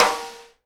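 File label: SIDE STICK, Live Snares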